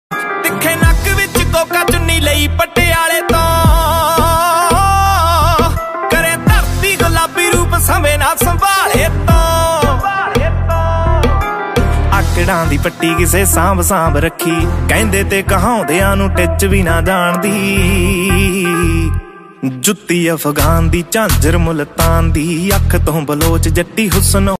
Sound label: DJ Punjabi Ringtone